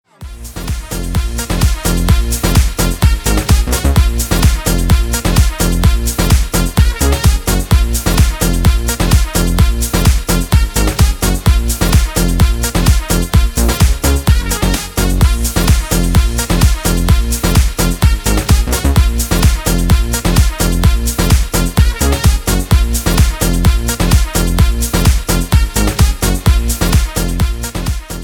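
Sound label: cheerful ringtone melody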